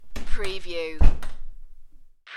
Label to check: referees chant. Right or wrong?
Wrong